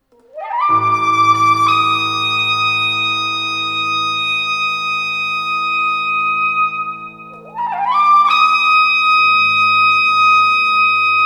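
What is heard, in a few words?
saxophone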